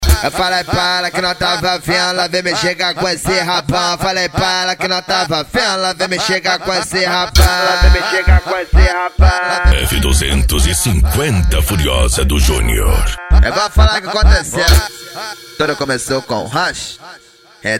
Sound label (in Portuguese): funk e sertanejo